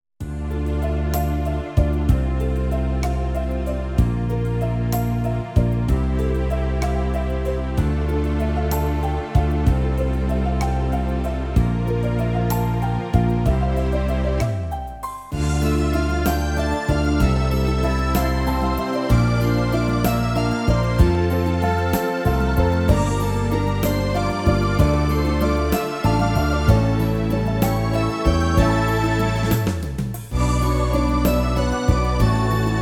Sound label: dreamy afternoon walk 68 ballad